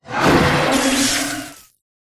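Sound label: pterodactyl boss buf; pterodaktilj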